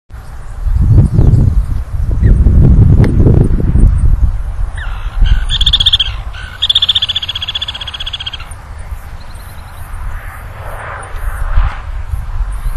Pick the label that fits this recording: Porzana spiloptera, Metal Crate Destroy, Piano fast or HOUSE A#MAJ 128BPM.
Porzana spiloptera